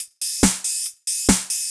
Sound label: Beat 140; Audacity; loops